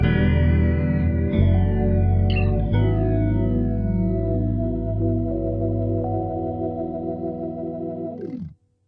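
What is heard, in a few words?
very well jazz smooth groove ending, 90 bpm; SYNTH AND ELECTRONIC LOOPS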